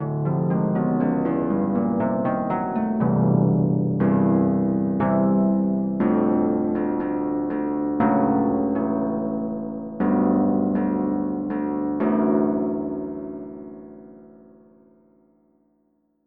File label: Soft Harp